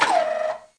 rotor Motor stop; Kerbal Space Program; KAS

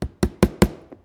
detach Static; Kerbal Space Program; KIS

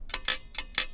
attach Wrench; Kerbal Space Program; KIS

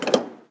container Mount; Kerbal Space Program; KIS

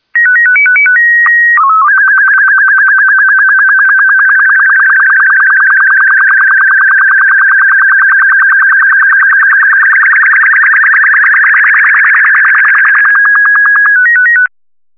sstv; Kerbal Space Program; Chatterer

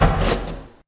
telescopic Section; Kerbal Space Program; KAS

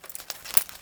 inventory Open; Kerbal Space Program; KIS

